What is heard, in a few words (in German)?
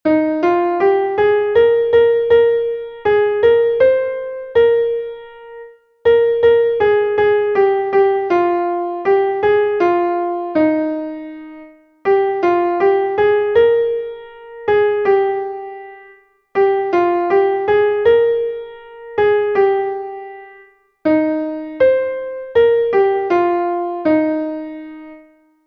klavier; MelodieMeister